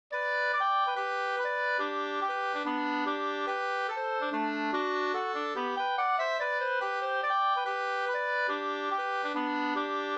reinlender G dur; e CG Malmberg

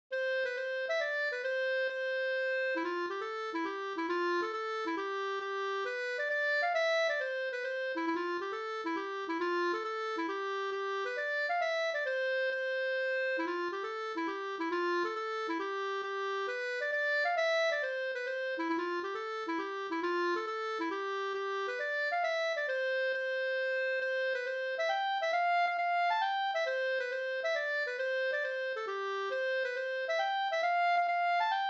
mazurka mazurkalin; e Ernst Abrahamsson Bh BgdINp56-3

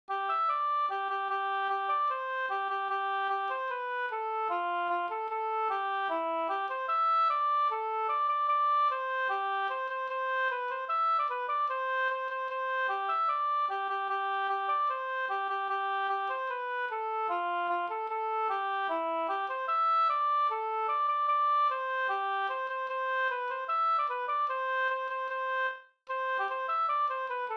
schottis 4-4

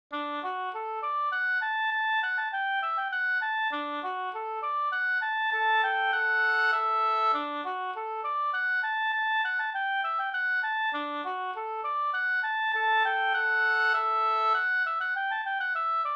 slängpolska

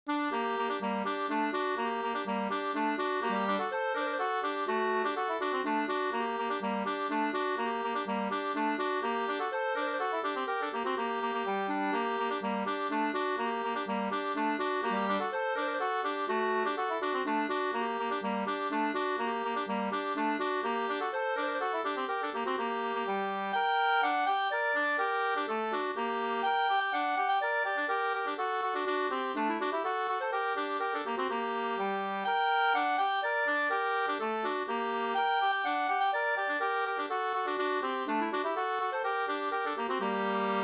slangpolska

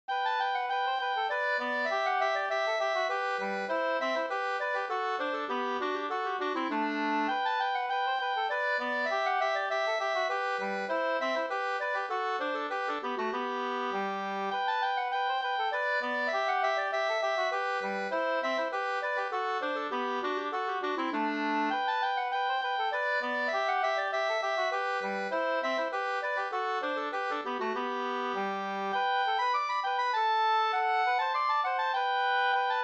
slangpolska

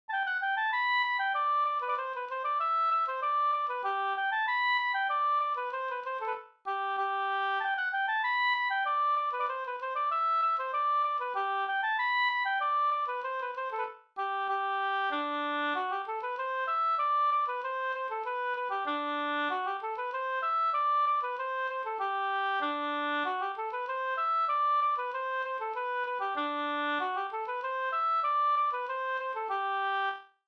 slangpolska

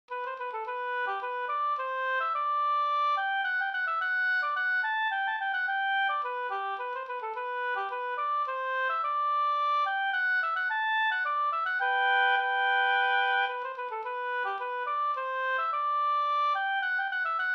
polska Brudpolska

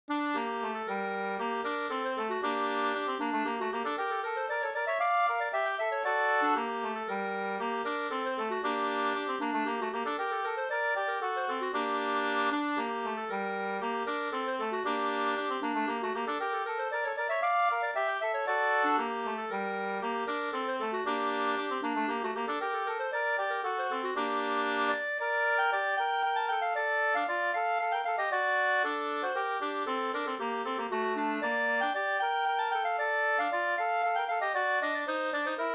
polska g moll

